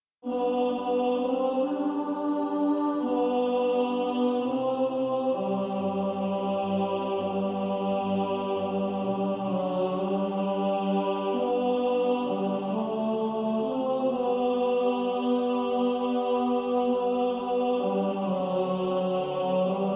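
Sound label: tenor, practice music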